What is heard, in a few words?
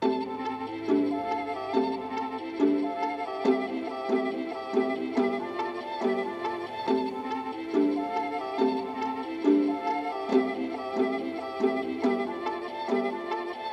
Flute, 140BPM C Maj; Stems; Cymatics; Green Fields